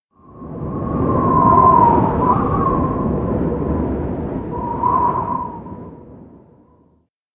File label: wind cry; anemoi